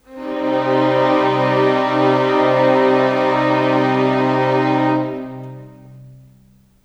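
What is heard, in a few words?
Pad C minor, Orchestral; Sonic Foundry (Sony Creative Software); Orchestral Series VOL-4 - Rock and Pop; Pads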